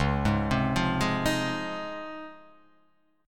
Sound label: C sharp Major 9th C sharp; x,4,6,5,5,3,5,x